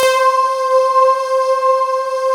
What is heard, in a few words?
SIGH C5; Big Fish Audio; Synth City; COMPOSITE